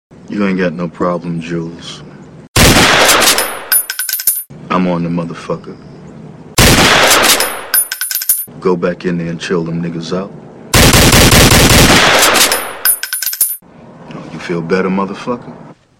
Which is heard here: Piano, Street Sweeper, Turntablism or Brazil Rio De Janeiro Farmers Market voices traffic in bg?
Street Sweeper